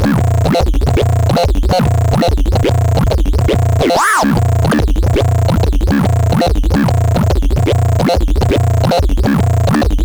cyclebox tri sine feeding pitch of 2nd cycbox table vowels